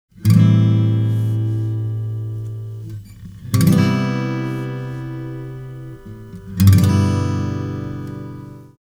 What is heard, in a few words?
Chords, guitar